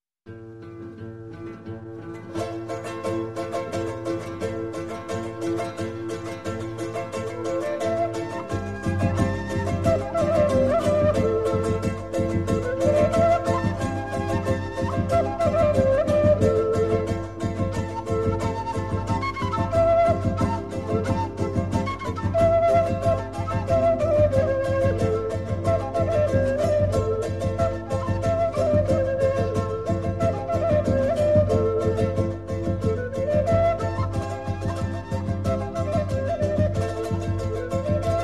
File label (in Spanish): moyobamba, flauta guitarra y arpa de los andes